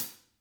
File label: hat, Vintage Timetraveler kits; Sonic Reality - Vintage Timetraveler